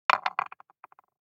DICE on Wooden Tray, Throw and Roll, Metal, 2 Two Dice; Samples; ArticulatedSounds; DICE Sfx Party (Extended)